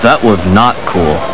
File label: dying